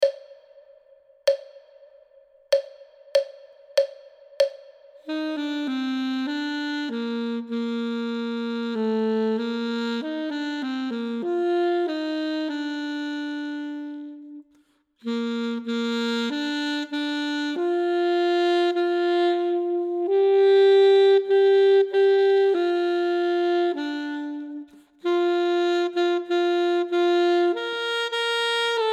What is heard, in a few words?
duet part 1; Ten Easy Duets